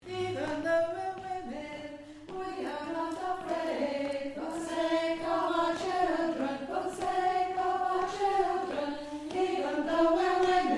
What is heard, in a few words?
Alto